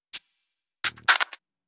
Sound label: Add Drums, 126 BPM; Vengeance Samples; Vocal-Hook Kits; Song Kit